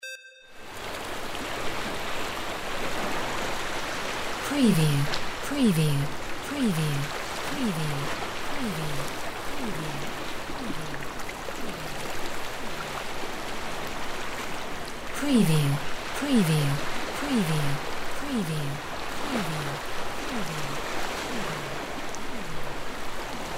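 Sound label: WATER SEA LAPPING GENTLE; windy beach audio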